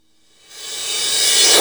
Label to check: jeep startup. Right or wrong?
Wrong